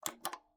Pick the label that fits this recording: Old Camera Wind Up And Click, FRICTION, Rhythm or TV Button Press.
TV Button Press